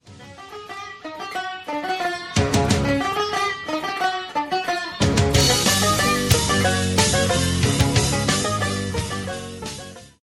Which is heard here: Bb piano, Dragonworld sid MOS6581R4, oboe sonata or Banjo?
Banjo